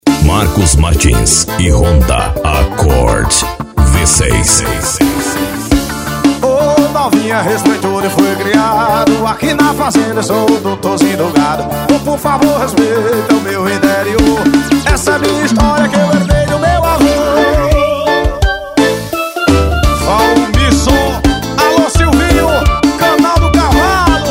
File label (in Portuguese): piseiro